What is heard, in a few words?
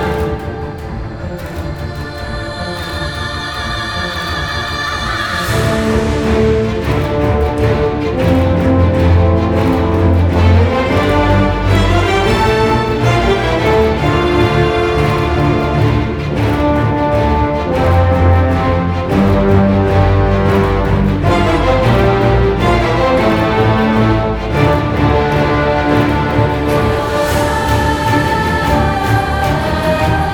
Boss 154BPM; bloom